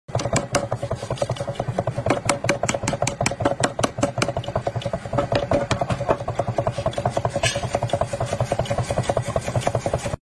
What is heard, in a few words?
copper hammer; چکش مسگری